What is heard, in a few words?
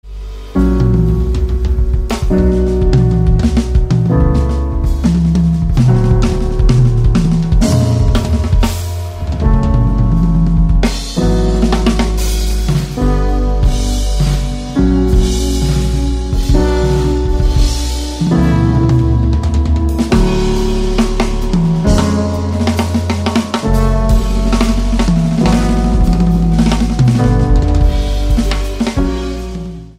Drumlogue; golden hour